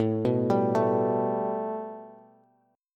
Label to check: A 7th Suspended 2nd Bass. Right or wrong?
Right